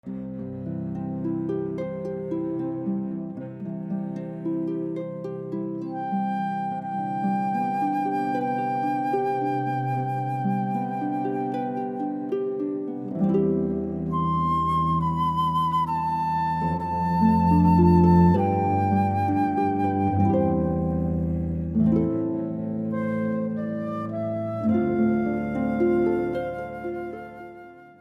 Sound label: Lord's Prayer, Malotte, harp flute cello